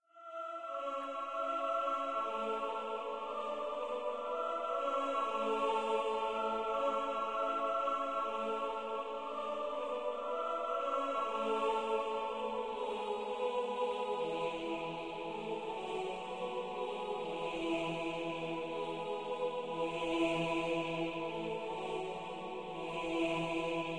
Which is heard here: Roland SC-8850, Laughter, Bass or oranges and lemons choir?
oranges and lemons choir